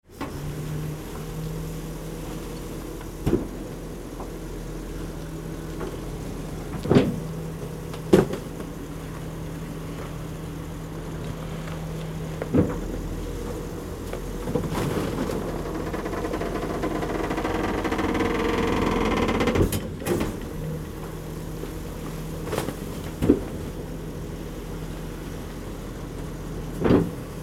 waste collection truck at work

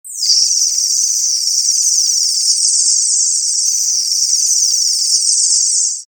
insects cluster

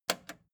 record player repeat jump out click slow

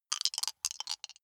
big two rattle in hand closed short

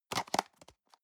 plastic box food small close hard

